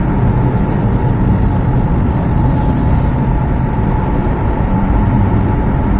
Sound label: amb shaft room; dm subchamber